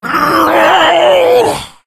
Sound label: death, infected; Left 4 Dead 2; Source Engine